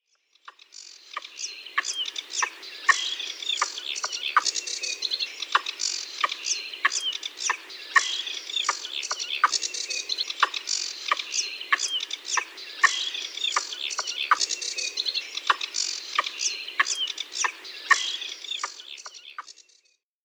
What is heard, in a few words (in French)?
Rat musque cris contact